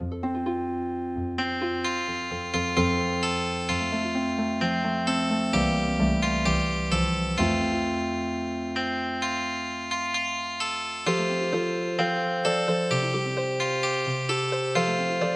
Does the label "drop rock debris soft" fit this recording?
No